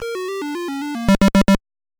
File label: game over